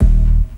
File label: LOFI HIT; Synth FX Misc